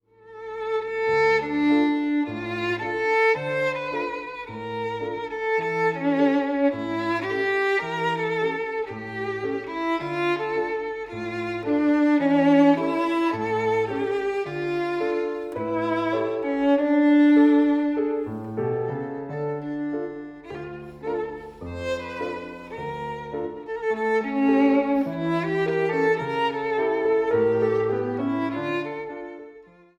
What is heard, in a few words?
VIOLIN